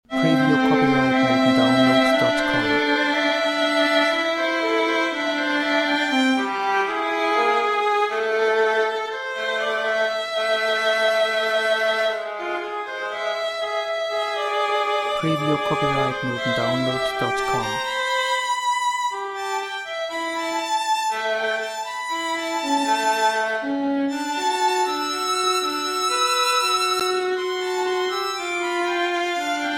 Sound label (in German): violinen